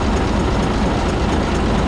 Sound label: truck; Mafie 1